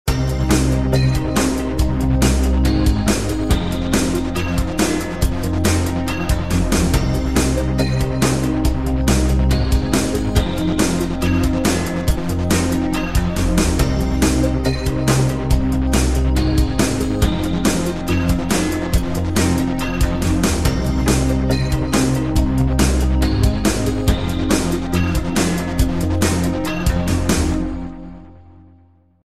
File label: KWS FIRE DANCE